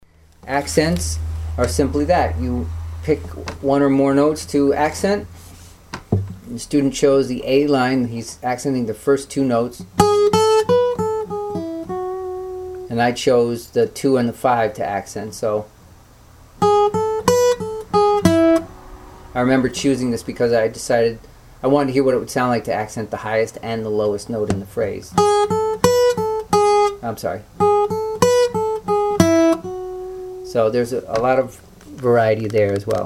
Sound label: varying a melody